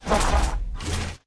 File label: guardian damage, monster; legacy guardian